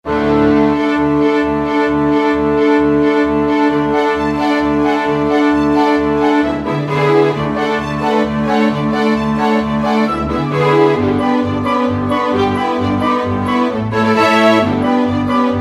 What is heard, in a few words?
orchestra